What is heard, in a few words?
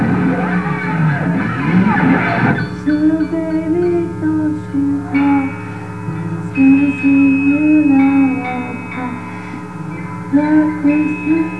Lullaby